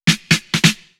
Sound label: Fill 128 BPM; Vengeance Samples; VEH1 Loops